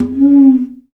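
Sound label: conga, AFRICAN PERC; Roland - LA Composer vol. 1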